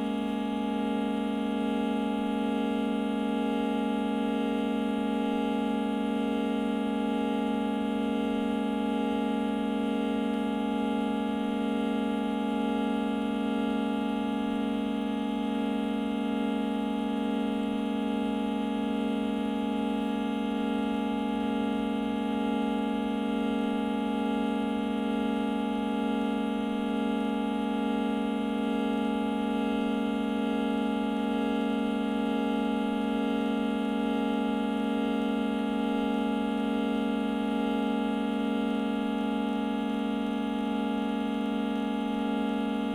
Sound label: drone